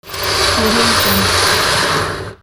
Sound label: curtain open long